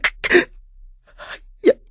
cry

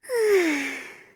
yawn; aya

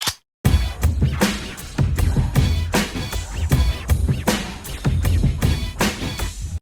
rap loop

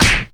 slap

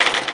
typewriter

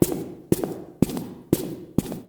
walk; library of ruina

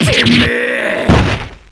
damage drop; terumi

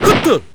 hop; Hazama

